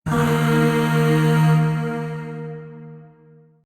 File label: Void Shawzin Chromatic Chord G; Warframe; Zariman Void Shawzin